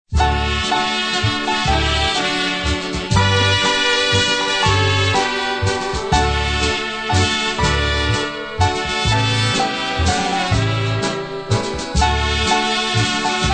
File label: waltz